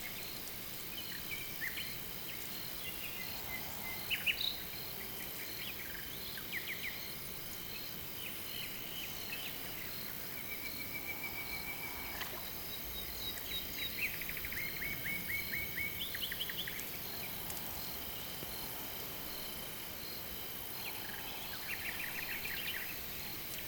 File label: Camp Night Ambient Loop; Quests; The New War; Ambience; Drifter Camp